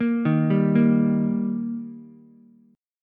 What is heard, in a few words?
Eb Major, down, Ukulele; arpeggiated